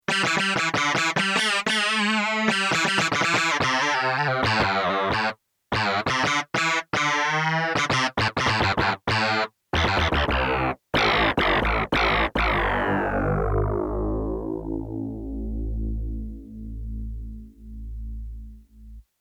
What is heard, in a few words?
FM DX7 Hard Sweep; grand classique de synthé analogique : imitation de balayage de filtre résonant. Oui on peut faire ça en FM